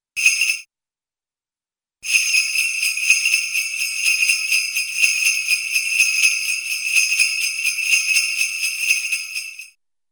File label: Sleigh Bells Shake; Cartoon; Hollywood Edge; Cartoon Trax